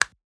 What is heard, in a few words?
comment